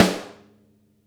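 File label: snare rim, Drum Kits; Sonic Reality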